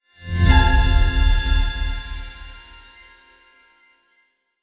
Holy magic spell long attack; fantasy

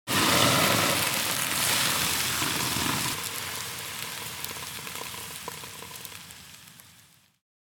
Fire extinguisher spray water sizzle

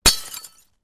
Glass bottle drop onto concrete ground

